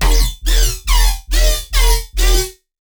Bass E, Dubstep; Bass One Shots; Ghosthack; Dubstep Sound Arsenal

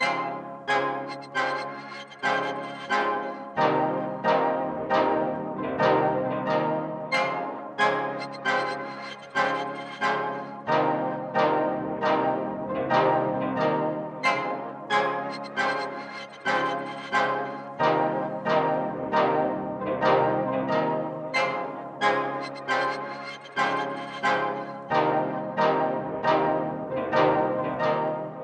135 BPM F Min Koto; Loop Stems; Cymatics; Agua

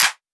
Clap; Drum One Shots

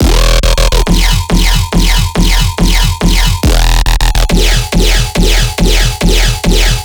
Riddim Bass Loop - E 140; Assassin; Ghosthack; Riddim Essentials Volume 2

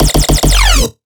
Riddim Bass Hit F#; Swarm

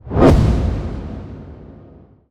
Whoosh Hit; Whooshes; Ghosthack; Conceptualize

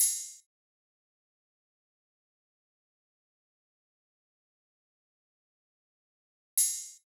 Drum Loop - 146 BPM Open Hihat; Drum Loops; Loop Stems; Cymatics; Mystery Pack Vol 4; Tighten Drum Loop

